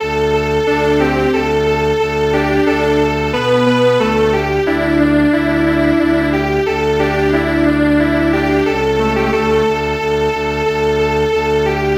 Japanese Processional Tune Strings Quartet, traditional